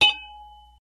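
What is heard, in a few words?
Metal Hit Clank Single, small impacts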